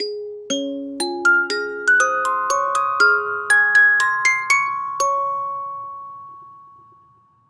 Music Box; Assassin's Creed Syndicate

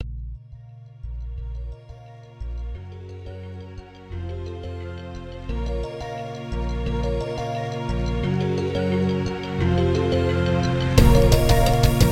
Instrumental; Curveball

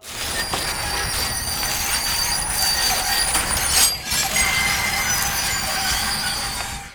Grinder Silver Plaque

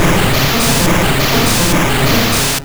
Minimize

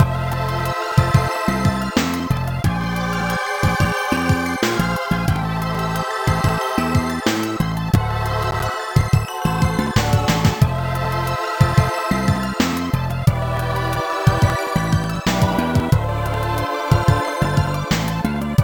Character Ending; Dragon Ball Z Shin Butouden; Saturn

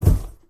Fall Impact Generic

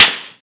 Knockout Short; talking tom cat 2 android ios online gamerip 2011

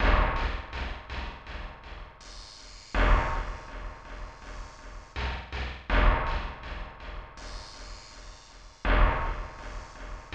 Metal and Sparks; Ignition Factor; SNES

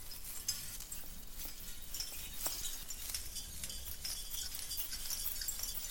Background Сhristmastree Sound

